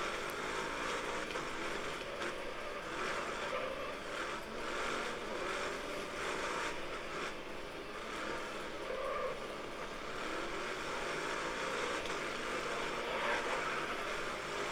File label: speaker; GT80Titan